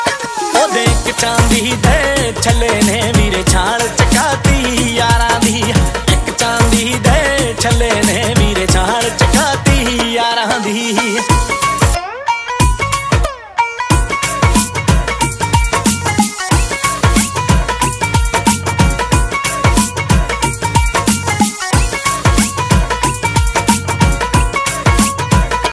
punjabi music ringtone

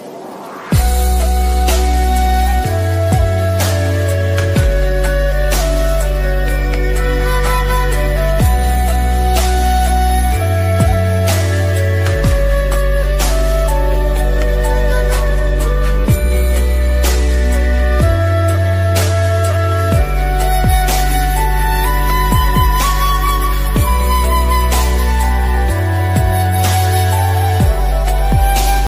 instrumental ringtone

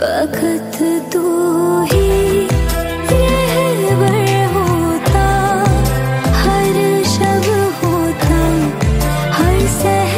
hindi ringtone; bollywood ringtones

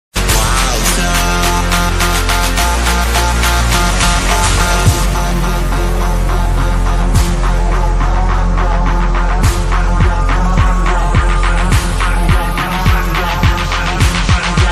beat dj mix song ringtone

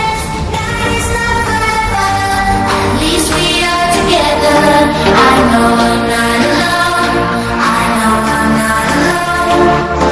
music ringtone

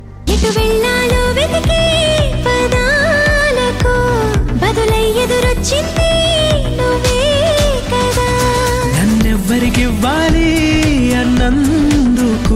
telugu music ringtone

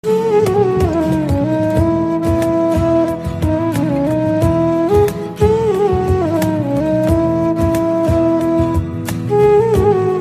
flute bansuri ringtone

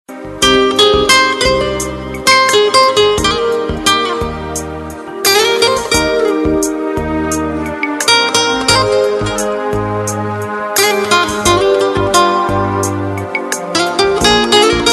guitar solo ringtone

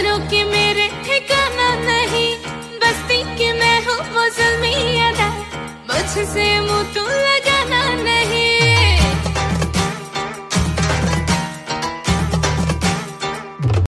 item song ringtone

